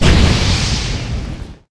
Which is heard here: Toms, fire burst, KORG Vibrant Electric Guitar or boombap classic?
fire burst